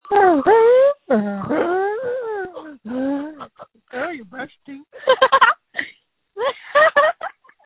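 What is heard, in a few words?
screams that feature laughter; screaming into a phone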